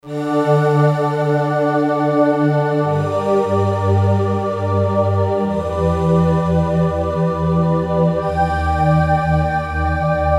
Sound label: Soft vox; Digital Synthesizer